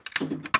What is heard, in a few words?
Metallic Click